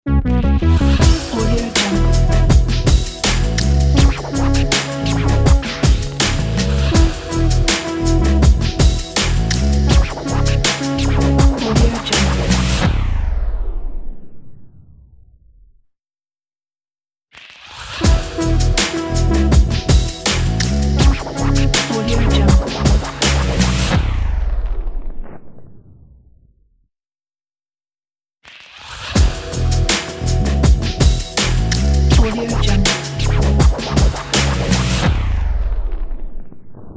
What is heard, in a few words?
fashion modern ident